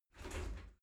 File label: shower slide door slide short close